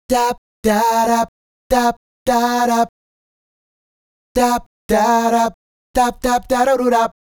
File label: Male Group, Hip-Hop and RnB Vocals